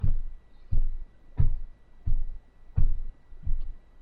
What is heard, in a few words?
footsteps; Soulforge Tower Defence